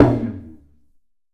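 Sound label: FX TALKING DRUM, PERCUSSION; AKAI S6000 CD-ROM - Volume 5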